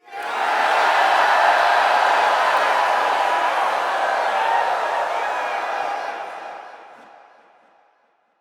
Heavy Cheer, Crowd; Lunaro

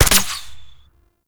Tranquillizer Rifle Shot; Warframe; Weapons; Tenno

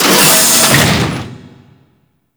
Infested Large Door Open; Corpus

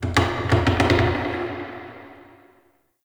Wood Creak; Warframe; Shadowgrapher

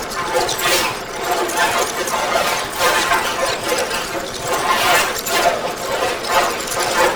Puzzle Orb Shot Loop; Warframe; Duviri Murmur; Medusa Puzzle

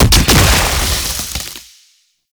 Pistol Alt Fire Projectile Explosion; Weapons; Scaldra

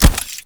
Rush Step; Warframe; Runner

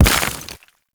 Mech Step Surface Dirt; Vehicles; Step Surfaces; Warframe; Nechro Mech

